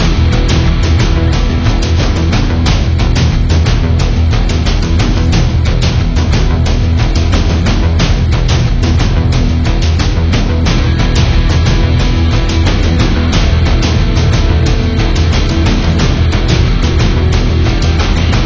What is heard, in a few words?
Tension Loop; Barter Town